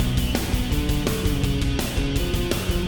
Boss Fight Phase 2 MIXED; Ben 10; Weatherhead Prime

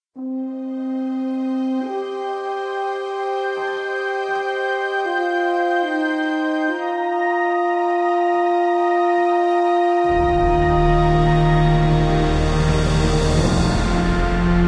bgm map background; devils demons android game music

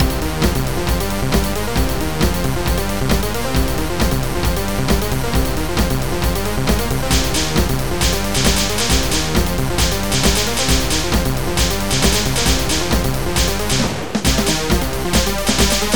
Techno; Metaltech Battledrome

